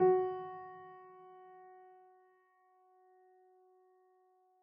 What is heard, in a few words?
piano sampler